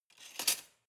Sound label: cutlery large drawer grab soft